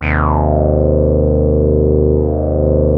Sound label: TAURUS E2, synthesizer